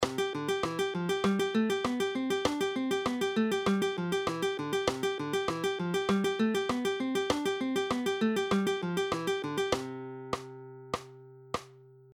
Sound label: Alternating bass Fingerpicking, Guitar Plucking